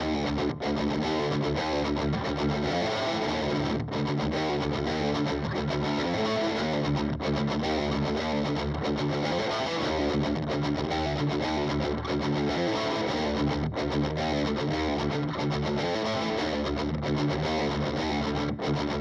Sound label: Boss GT-1000 Core Hi Gain